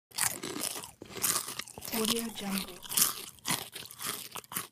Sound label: chewing hips